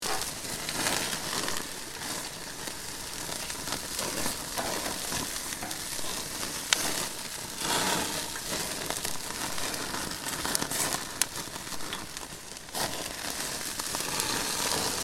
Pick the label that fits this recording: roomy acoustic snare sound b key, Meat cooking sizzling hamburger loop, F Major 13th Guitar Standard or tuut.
Meat cooking sizzling hamburger loop